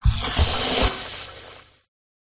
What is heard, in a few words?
sci-fi door movement; the sound pack tree